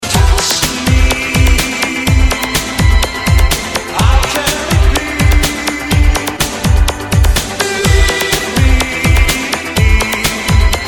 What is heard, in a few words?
80S MARCH MEGAMIX LOVE IN DISCO